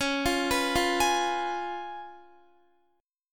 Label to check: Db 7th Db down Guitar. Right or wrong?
Right